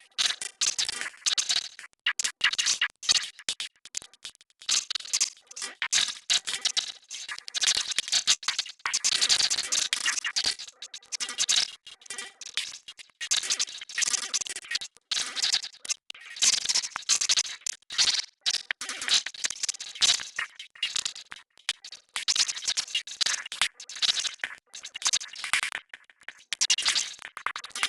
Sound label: process malfunction computer glitch; sonniss gdc